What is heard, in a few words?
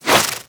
Tesla Ball Toss; Trapper